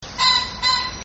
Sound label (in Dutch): fazant; vogelsflevopark